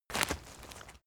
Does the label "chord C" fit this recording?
No